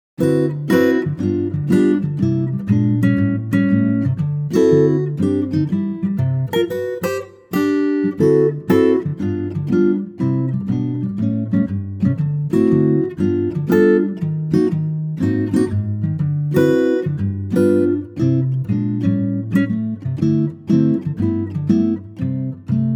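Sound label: Acoustic Guitar C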